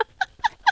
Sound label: High pitched song like female